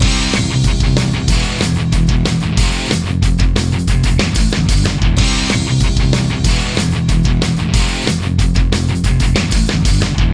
Theme short loop instrumental; Ace Lightning; Windows

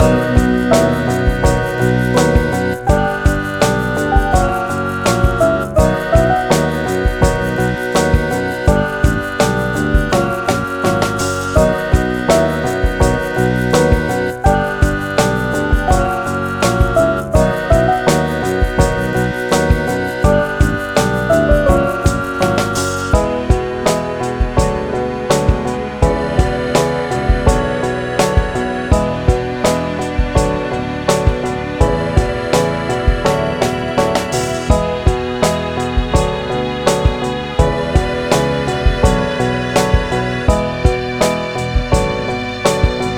Factory Loop; Sour Patch Kids: Sour Fling

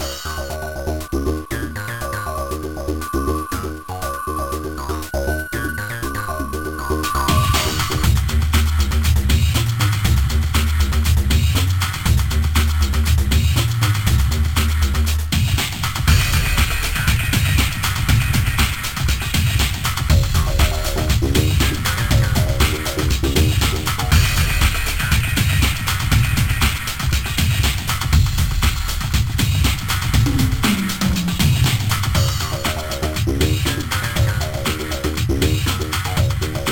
Interface; Urban Yeti; gba

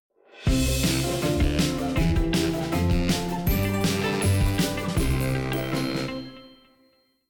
Jingle Race Won; Thomas & Friends Go Go Thomas

